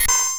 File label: rare find; WoWInterface; AllTheThings